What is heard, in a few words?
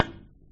stick ball, pool